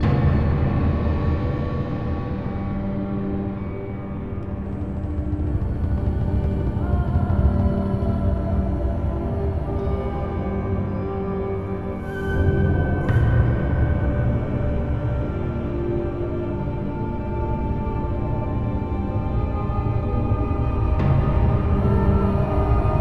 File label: intro countdown; heroes of order chaos; android ios mobile gamerip 2012